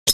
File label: grenade pin delay